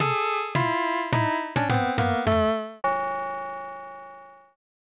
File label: you lose; Game no Tatsujin; SNES